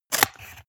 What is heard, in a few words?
scissors cut paperboard